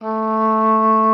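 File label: A3, oboe d'amore; Xsample VOL-7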